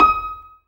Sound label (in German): ZIMBAL D#5; Best Service; Ethnic Journey Sound Cube